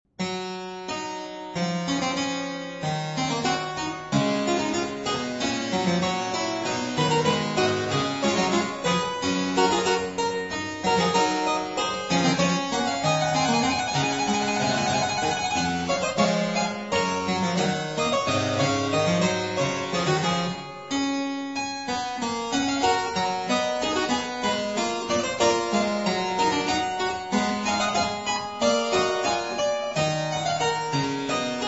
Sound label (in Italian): Alla breve a 1 Clav.; Variatio 22